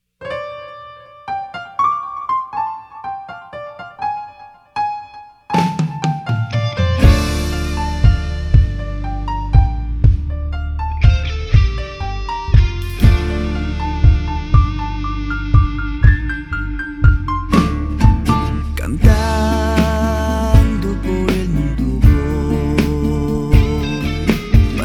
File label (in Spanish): Cantando